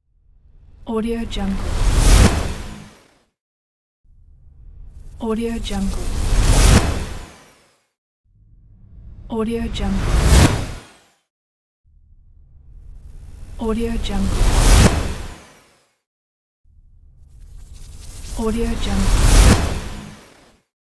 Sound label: cinematic flash